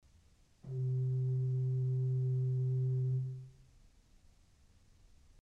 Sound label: Principal 4' C, ORGEL pipes